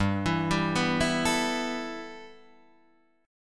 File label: G 6th Add 9th, Guitar, Open D